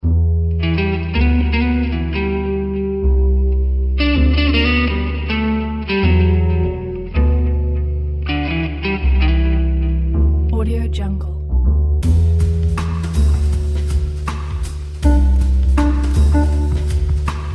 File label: jazz; innerverse